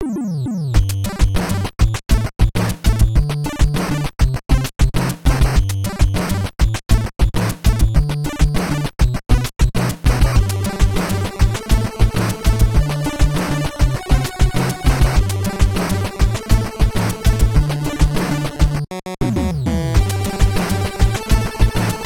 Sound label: Instrumental; Friday Night Funkin Holiday mod windows gamerip 2021